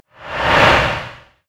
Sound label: sword transform